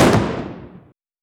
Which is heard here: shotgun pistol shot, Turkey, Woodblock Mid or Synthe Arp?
shotgun pistol shot